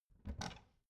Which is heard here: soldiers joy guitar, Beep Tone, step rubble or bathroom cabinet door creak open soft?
bathroom cabinet door creak open soft